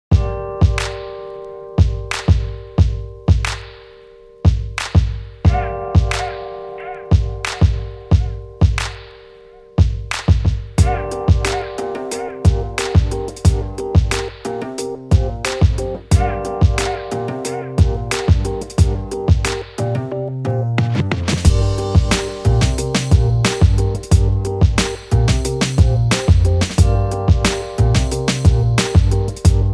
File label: Big Apple Bounce cool; reportage